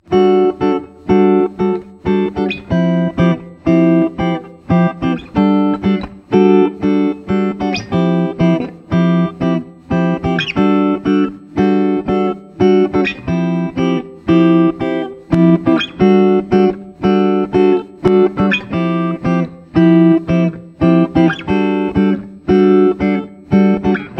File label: natural guitar